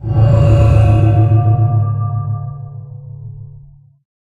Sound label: power, beacon; block; Minecraft